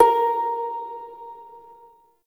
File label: Prime Shawzin Oct Two A Sharp; Warframe